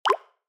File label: ui click